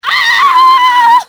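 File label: scream, female; fmale; Soldier Of Fortune II Double Helix; XBOX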